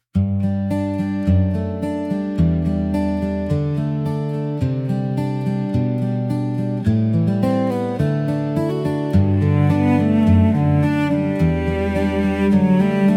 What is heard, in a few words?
Instrumental